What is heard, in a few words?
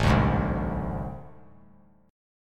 A Minor Major 7th Double Flat 5th, down; strummed; JGuitar